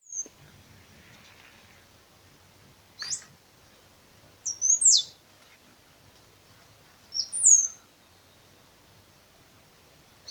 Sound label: Arremon assimilis, Cerquero picofino